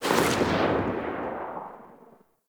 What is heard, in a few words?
Infested Spore Pistol Tail; Weapons